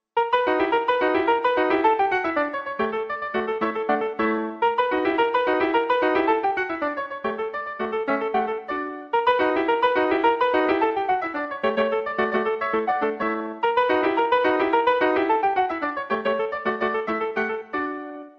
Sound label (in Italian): Agitato e Calmato